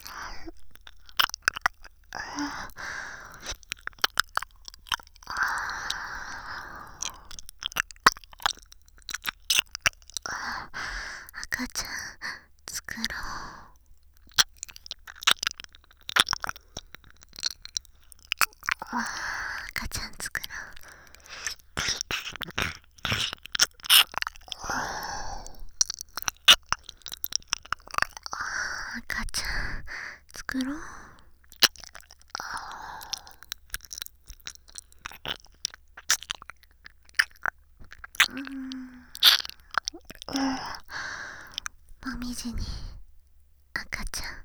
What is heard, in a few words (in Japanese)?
耳舐め; 赤ちゃん作ろ♪